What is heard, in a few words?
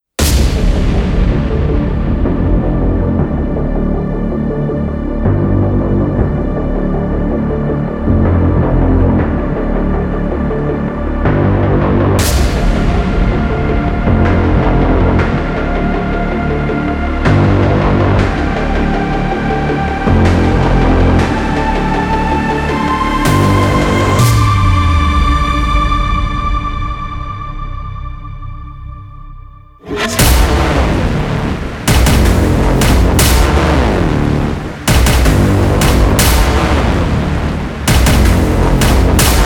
no choir